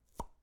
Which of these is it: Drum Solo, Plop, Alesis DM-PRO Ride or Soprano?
Plop